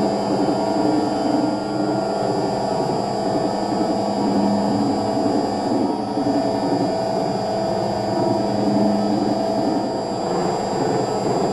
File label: cloak drone beam; coop; Titanfall 2